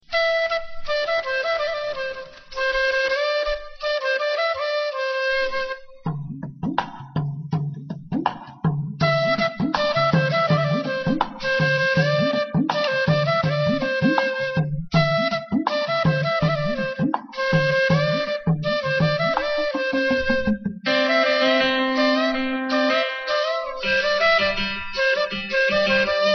Best Tune

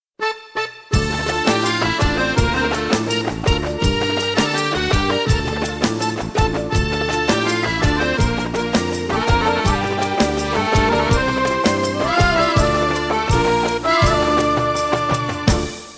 Tones; Raya

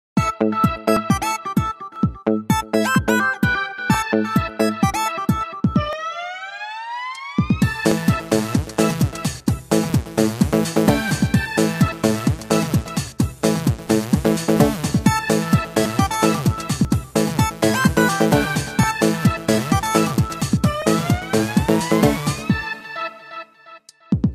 Robotique

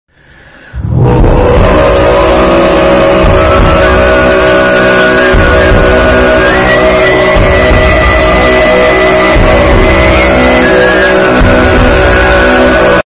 Mongolian sing

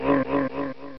Kill Zombie

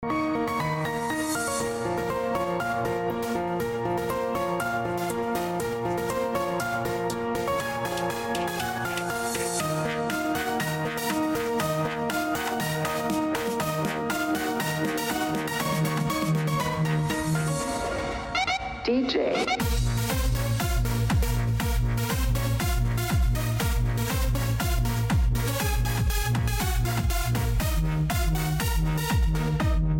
Pianoplayer

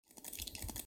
Pen Clicks; Andrew Huang

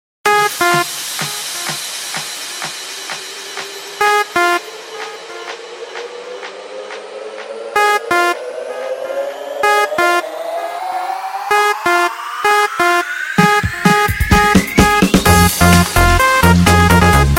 Pip Tone

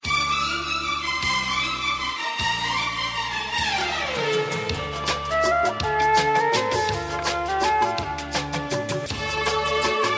Instrumenta

